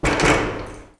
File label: CHEST CLOSE; sloodle